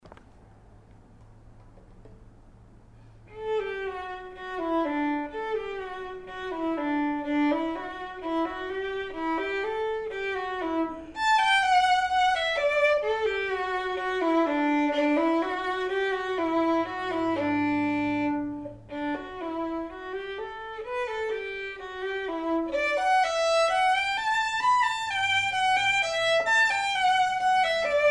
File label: perf vln